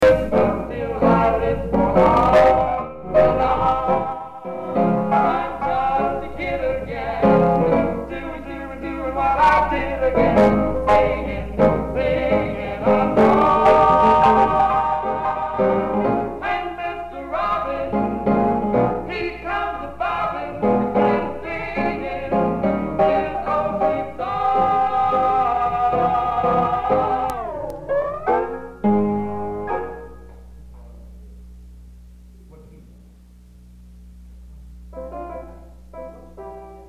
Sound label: High School Trio; Oscar Smith